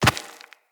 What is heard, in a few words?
Walk Rock, Footsteps; Operator